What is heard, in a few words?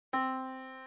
yamaha Disk